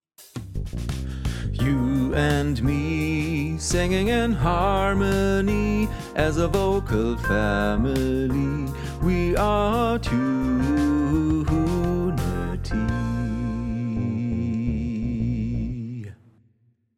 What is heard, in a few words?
bass